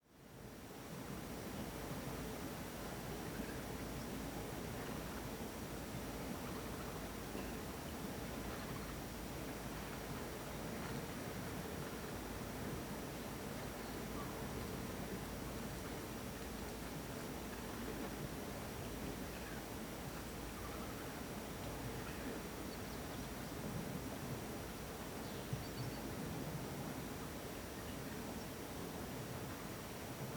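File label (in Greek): Κύρια πύλη φυλακών Χειμώνας; Κύρια πύλη φυλακών 2 Χειμώνας (ΗΧ); Fulakes mprosta ORTF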